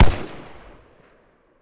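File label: weapon ar fire player atmo exterior; gmod; viper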